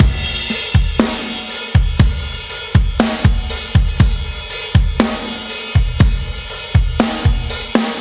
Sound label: Heavy C, rock